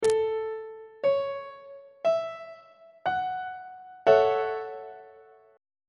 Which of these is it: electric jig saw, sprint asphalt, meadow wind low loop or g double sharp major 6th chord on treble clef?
g double sharp major 6th chord on treble clef